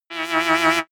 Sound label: sci-fi scanner wobble high